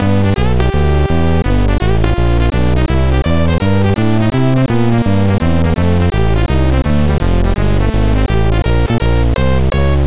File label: c64 jazz